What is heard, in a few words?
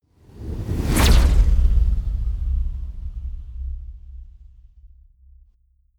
sci-fi flyby plasma flutter smooth